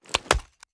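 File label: reload fast end, shotgun; reloads; iw8; romeo870; gmod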